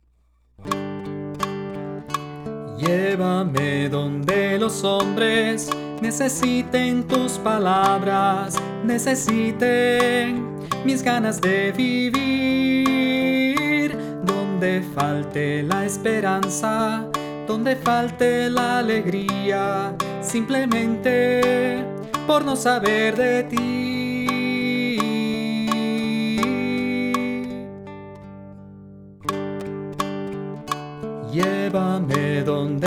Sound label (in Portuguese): Tenor